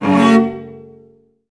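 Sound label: cellos F; Best Service; Peter Siedlaczek's Advanced Orchestra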